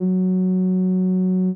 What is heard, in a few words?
string